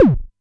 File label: Amdek Perc